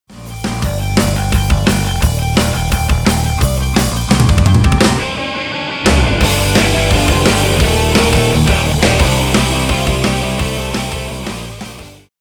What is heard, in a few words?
Instrumental